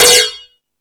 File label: Rap Session, E-MU Pro-Cussion